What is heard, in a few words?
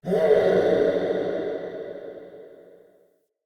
cave mysterious distant; Ambience; Shrine Cave